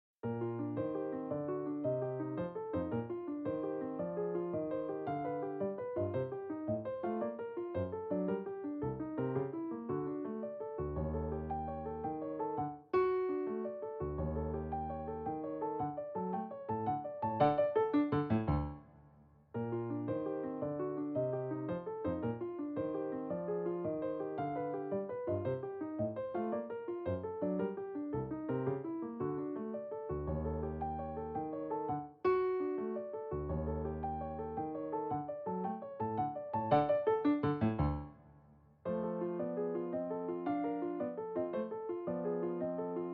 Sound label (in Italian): Scherzo per pianoforte; Walter August